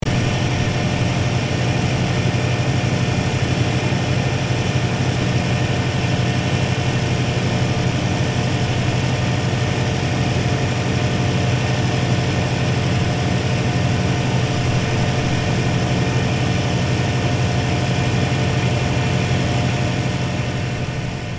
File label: waste truck